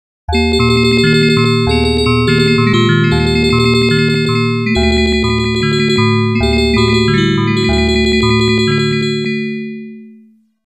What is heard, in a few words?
Vibraphone